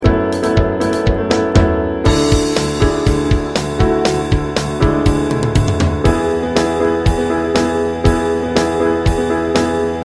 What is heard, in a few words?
Piano Drum